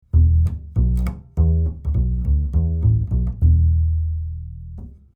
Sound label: Neumann KM83 Upright Bass close 30cm